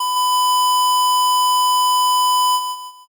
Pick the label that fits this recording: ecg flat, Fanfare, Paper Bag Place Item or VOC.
ecg flat